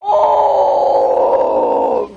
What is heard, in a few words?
scream old man; ooh ahh